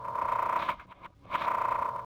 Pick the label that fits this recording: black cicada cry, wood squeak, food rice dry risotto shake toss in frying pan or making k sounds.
wood squeak